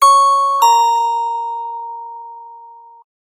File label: Dingdong, notifications; Pantech; Perception